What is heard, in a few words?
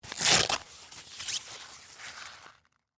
Sphygmomanometer, Remove, From, Arm, Velcro, Blood, Pressure; Emergency; Hospital